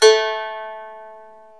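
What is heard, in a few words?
ethnic plucks; Eth Plucks, Pluck Duo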